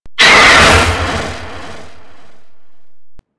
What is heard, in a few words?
Golem Pain; Warframe